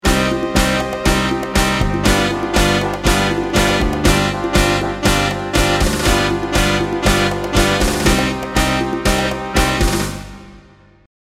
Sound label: bittersweet argentina, loops